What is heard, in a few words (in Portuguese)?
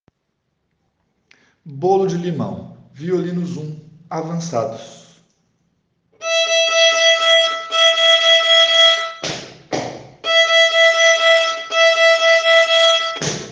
Violino 1; violino kids